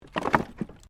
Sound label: Wood Movement Minor